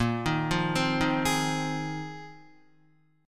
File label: Bb 9th Suspended 4th, Guitar; arpeggiated; JGuitar